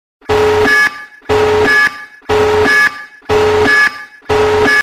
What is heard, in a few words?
tactical nuke sound; modern warfare 2